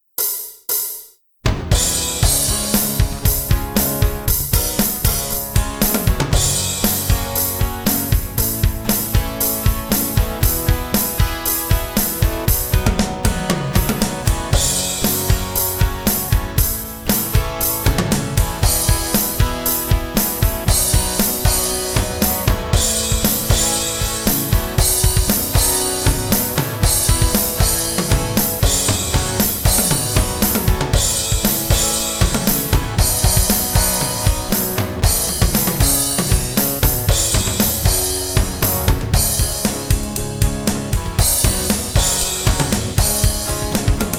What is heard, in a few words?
acoustic guitar; galleryfurniture